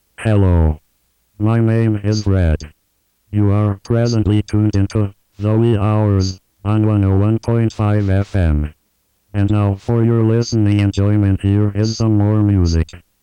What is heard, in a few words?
jingles; Station Call #2